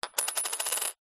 money australian 20 cent coin set down on tiled table spin; foley